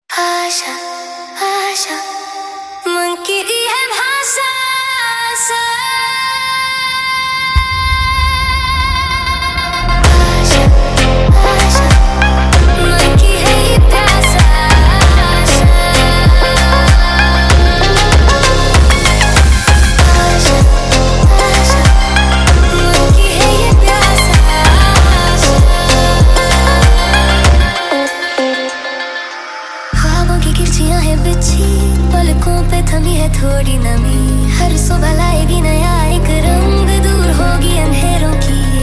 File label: sped Up